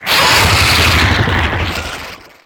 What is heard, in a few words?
Harpooned; Evolve; Wraith